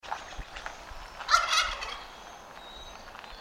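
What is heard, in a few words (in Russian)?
индейка близкий короткий крик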